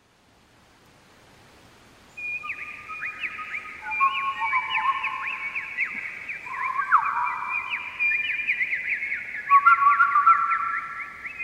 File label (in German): Vogelgesaenge